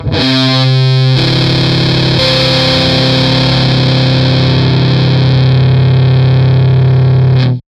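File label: Fuzztest guitar ultrafuzz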